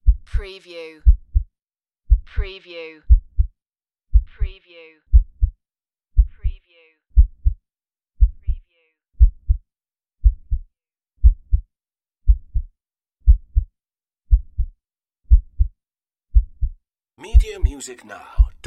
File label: Heartbeat Slow Low